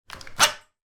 household shower head remove from holder hose scrape